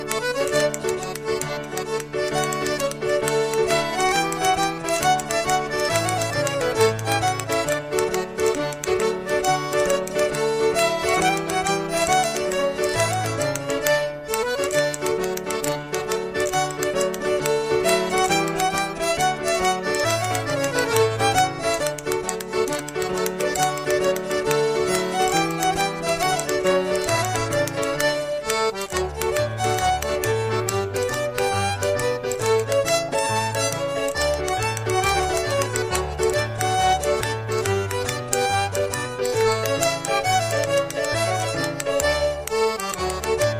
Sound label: hornpipes